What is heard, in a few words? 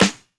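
CLAP snare; Drums In Your FACE!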